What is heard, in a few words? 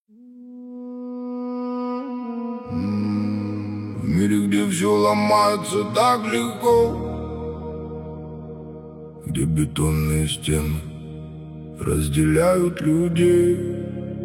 deep atmos; plotnyj zvuk